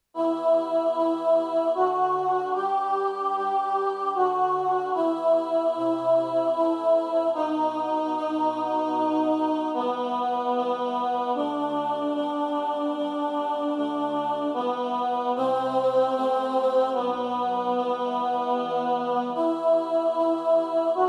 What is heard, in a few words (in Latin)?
Oculi nostri alt